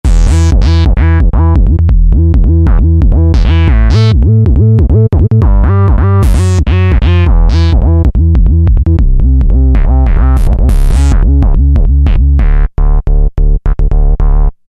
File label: Cheezee Bass; Roland SRX-05 Supreme Dance